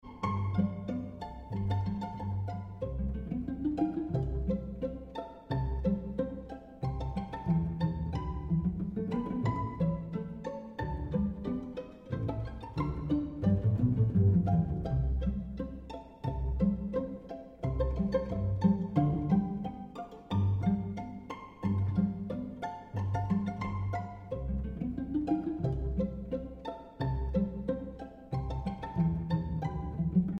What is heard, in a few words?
pizzicato; Pizzicato Intermezzo; LYT TIL MUSIKKEN